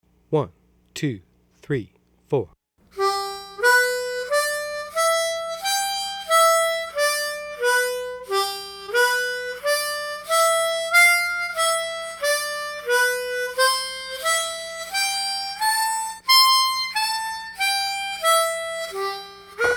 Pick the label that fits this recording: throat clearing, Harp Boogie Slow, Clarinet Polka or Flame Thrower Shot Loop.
Harp Boogie Slow